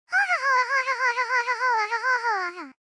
funny minion message notification sound effect

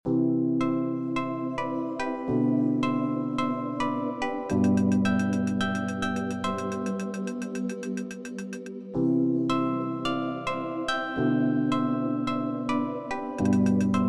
special state loop

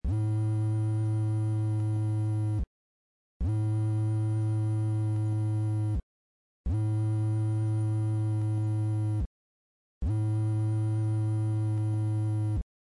cell phone vibrating ring

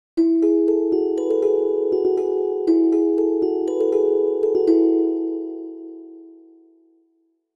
ringtone

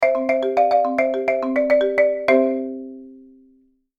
marimba ringtone

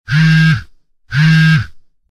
phone vibration

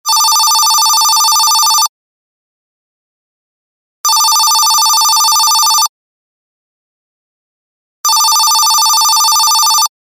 telephone ring

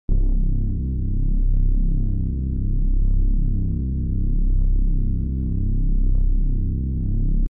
cinematic background

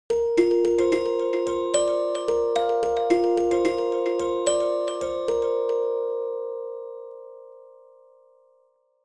ringtone good day

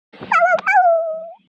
cute puppy sound effect

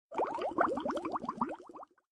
notification

